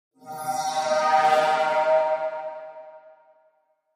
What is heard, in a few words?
Wash Mystical Elect